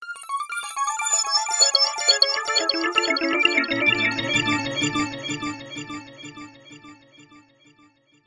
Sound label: arpeggios arpeggio